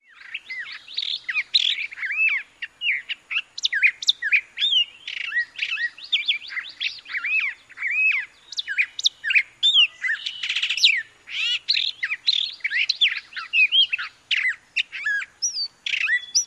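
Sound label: song, long-billed thrasher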